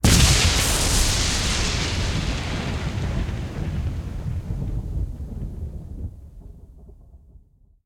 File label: Lightning